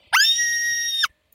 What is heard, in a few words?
Screaming